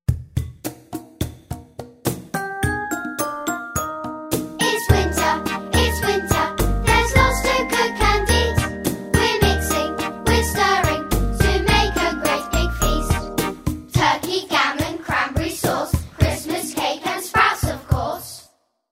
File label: Seasonal Food Rap Winter